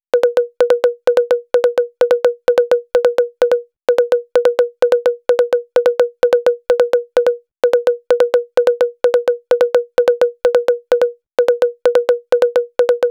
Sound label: Rap 808 Toms Chorus, 128 BPM; Vengeance Samples; VTDS2 Song Kits; Rap To The Top